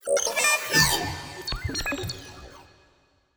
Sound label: portrait machine; Stellaris; toxoids; portraits